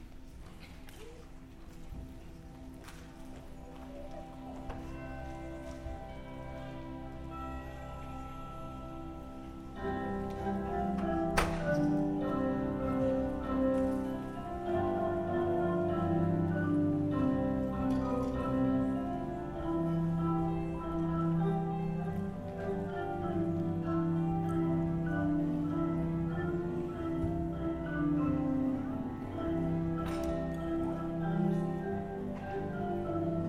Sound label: Percussion Feature, Beginner Winter Concert; Knight Band